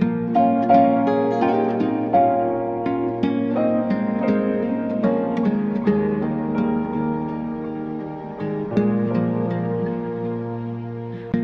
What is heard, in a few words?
guitar piano, Sad; Loops